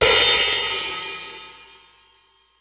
HH, Drum Dry; East Collexion; Drum 1 Dry

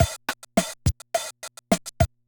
Loop, classic machines, rpm 40

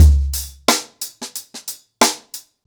Ripple Effect 90BPM, acoustic drums; The Roots Drumloops

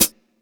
Stadium Rox, Drum Machines, E-MU Pro-Cussion

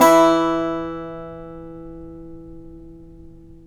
SAZ; Spectrasonics; Hans Zimmer Guitars VOL-2

